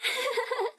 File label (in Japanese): 笑